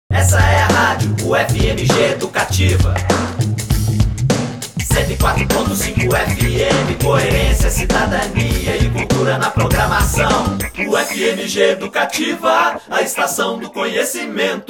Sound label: jingle